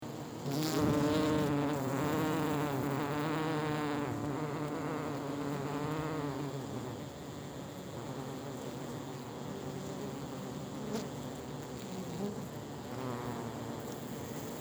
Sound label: ANIMAL SOUND BEES